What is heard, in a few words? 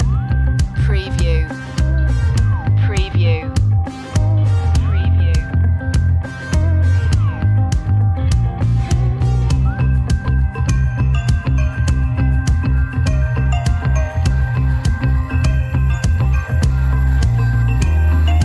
showroom loop